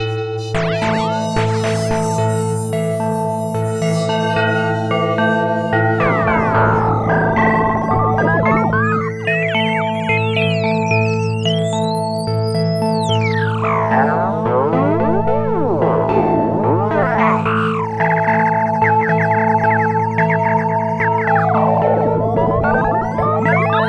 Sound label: Cypher FM tweaks